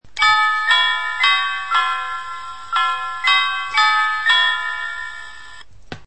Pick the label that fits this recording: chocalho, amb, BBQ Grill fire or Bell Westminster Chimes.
Bell Westminster Chimes